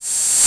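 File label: Rev Open HH; Quasimidi Rave-o-lution 309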